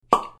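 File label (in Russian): probka, cork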